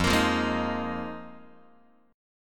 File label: F Minor 11th, Guitar; Fm11; strummed; JGuitar